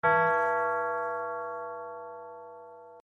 church bell ringtone

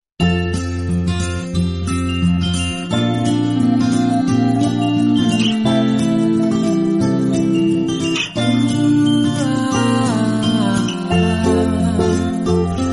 creamy alarm tone; HUAWEI

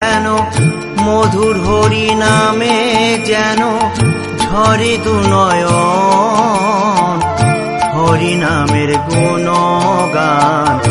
BENGALI BHAKTI RINGTONE

